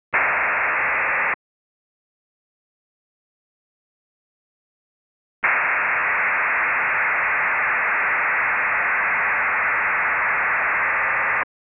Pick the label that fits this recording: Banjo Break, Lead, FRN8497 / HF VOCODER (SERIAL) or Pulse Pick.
FRN8497 / HF VOCODER (SERIAL)